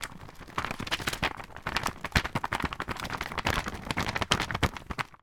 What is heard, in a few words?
paper wad score cards flick through; foley